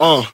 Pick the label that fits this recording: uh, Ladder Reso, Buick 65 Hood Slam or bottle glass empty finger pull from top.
uh